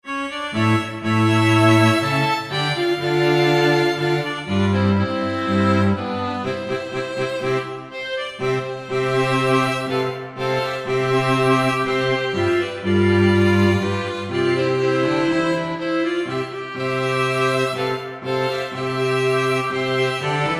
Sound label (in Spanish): mozartiada sin flauta